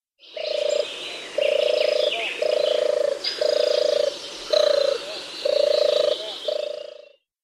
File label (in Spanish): tórtola europea